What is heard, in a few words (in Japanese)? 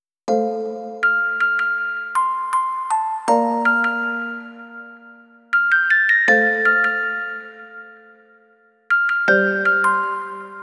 オルゴール ver.